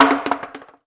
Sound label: Bone Stn Hit; moul